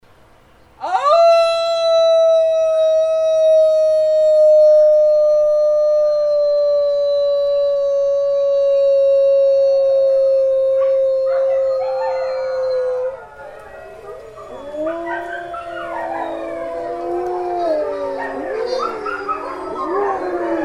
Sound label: Howl